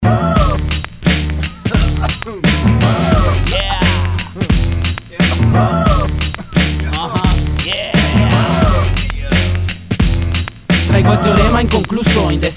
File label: Jingle podcast